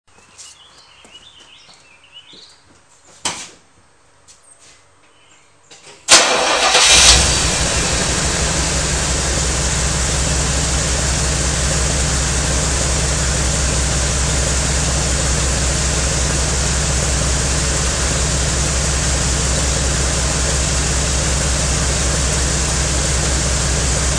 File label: Slow Idle No Choke